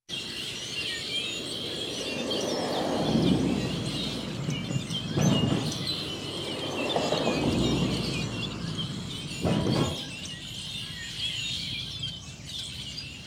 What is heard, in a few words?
Roosters and Traffic